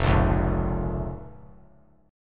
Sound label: F Suspended 4th Sharp 5th F; strummed; JGuitar